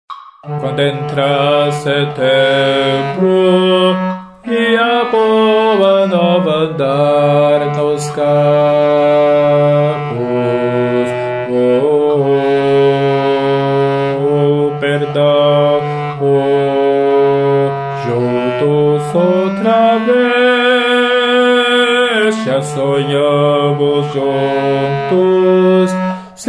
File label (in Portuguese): baixo